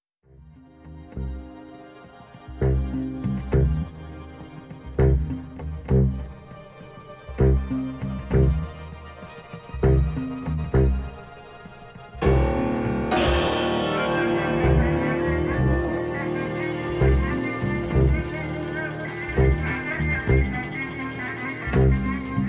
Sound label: Rock; Audio Loudspeaker Quality